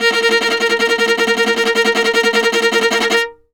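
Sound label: viola tremolo A#3; Orchestral; DirectWave; Varazdin Orchestral; Viola II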